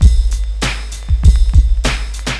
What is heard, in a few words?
drum loop 100; Vinyl; Masterbits; Vinyl Attack